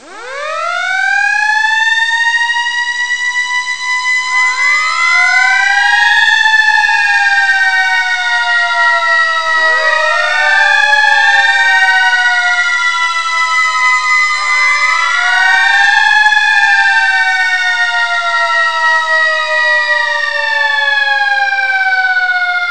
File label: siren